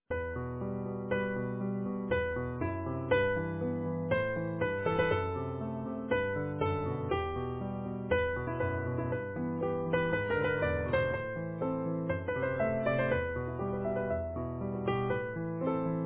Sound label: Easy Piano